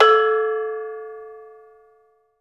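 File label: METALOPHONE, Pitched Percussion; E-MU Producer Series Vol. 7 – Old World Instruments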